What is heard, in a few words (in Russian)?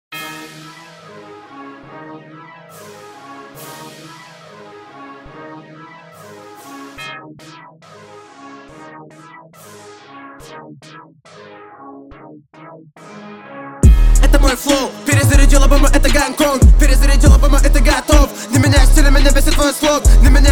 DNB; гонконг